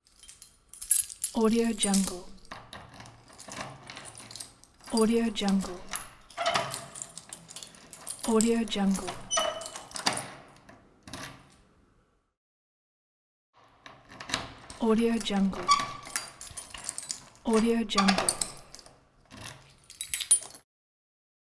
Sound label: keys